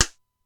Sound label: Simmons SDS-9